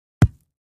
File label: Ground Hit Sledge Ham; rocks & dirt